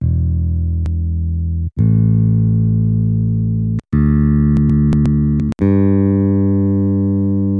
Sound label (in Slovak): ladenie 4str basy bez metr